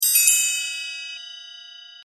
bell

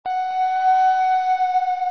Flute

